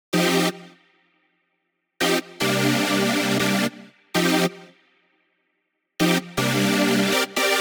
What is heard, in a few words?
120 Cm Stabby Funk, Synths & Keys; Loops; Touch Loops; CosmicFunk